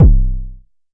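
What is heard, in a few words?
kick, Jomox MBase 01, drum machine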